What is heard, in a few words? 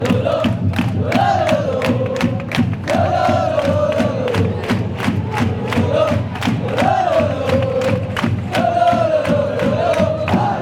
lololo, fanchants; valencia